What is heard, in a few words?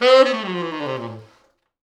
T Sax falls, Horns Sax